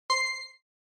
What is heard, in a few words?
Single Accent Short